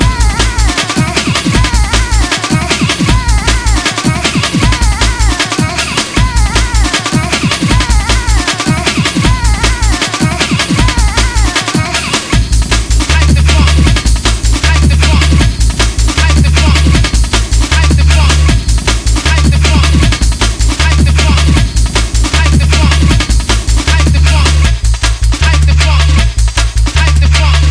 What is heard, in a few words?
Bass Funky Hardcore Remix